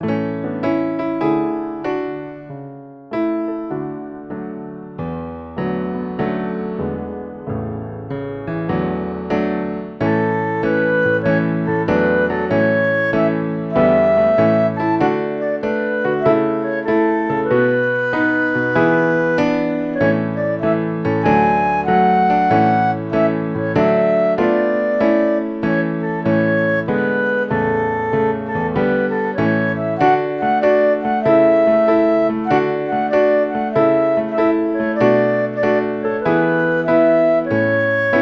karaoke

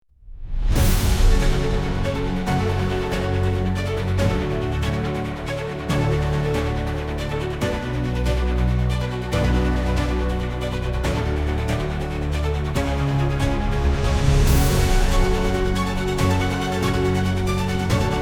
breaking news symphony; Els pica soques som periodistes

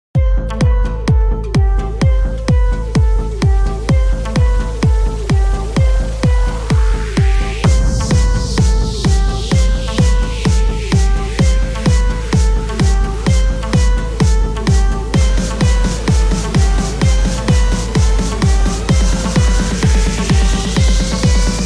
Party Hard Cats Fiesta Electronica de Gatos

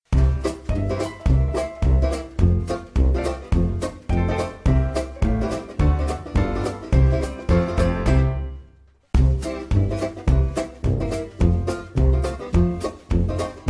Karaoke

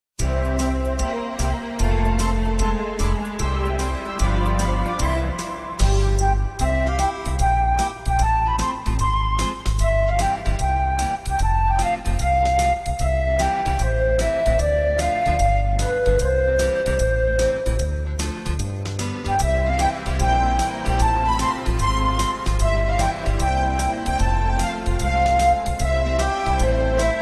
karaoke

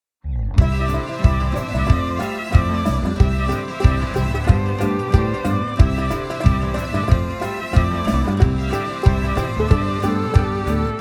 Water Cycle Goldilocks Song; teatre zona nord 1r i 2n

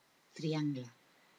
TRIANGLE

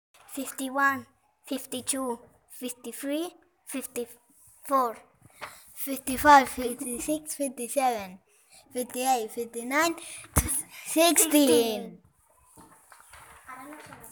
Numbers rap

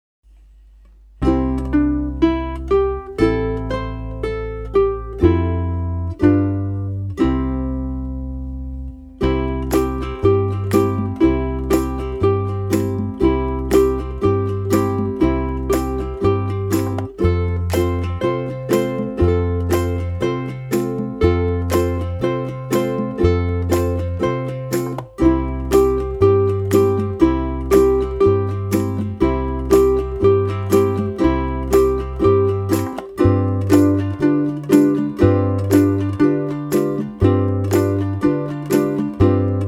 Emanono instrumental

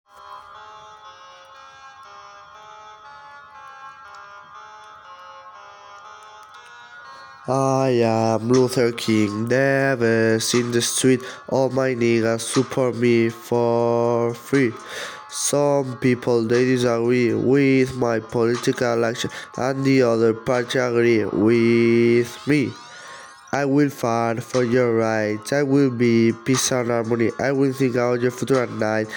Rap; reflexions sobre la figura de martin luther king

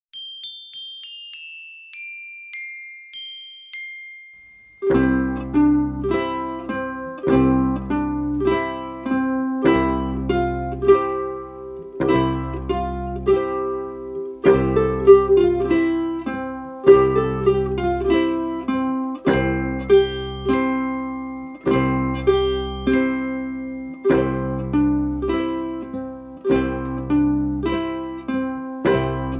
Frere Jacques instrumental

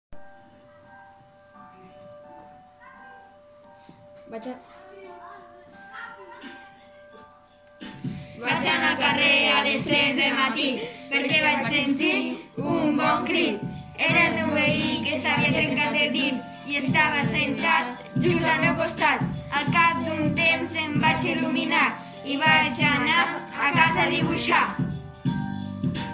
rap

